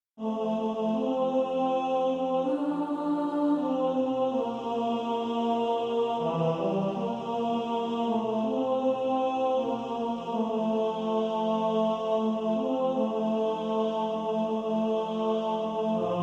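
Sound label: Where he leads me ten, tenor, choir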